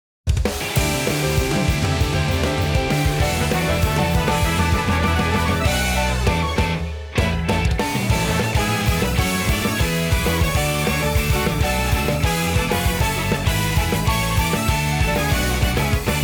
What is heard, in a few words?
inst